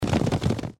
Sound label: Horses; Age of Empires III